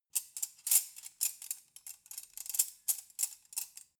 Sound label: cutlery forks handling long